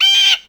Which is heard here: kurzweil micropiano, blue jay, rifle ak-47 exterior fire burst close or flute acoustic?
blue jay